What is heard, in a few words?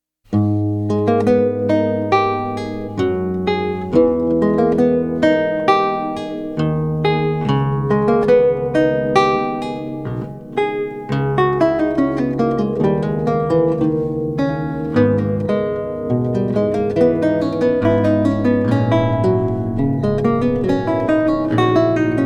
Lute Partita in C Minor, Prelude; Bach, J S; BWV 997